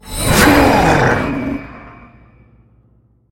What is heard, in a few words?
leap; cstrike; war3source; pred